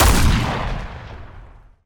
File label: usas explosion